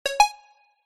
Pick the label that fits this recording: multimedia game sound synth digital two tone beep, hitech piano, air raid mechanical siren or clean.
multimedia game sound synth digital two tone beep